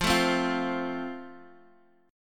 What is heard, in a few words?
F Major Guitar Open G; x,x,3,2,1,3; down; chord shape